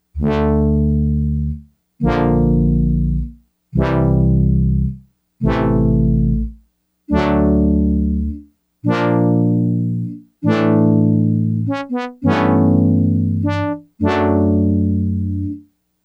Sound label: Horns, Yamaha DX100; Factory Patches